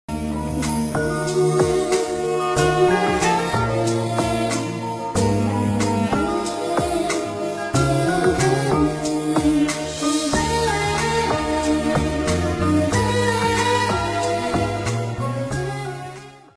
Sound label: feel music ringtone instrumental ringtone; Awara movie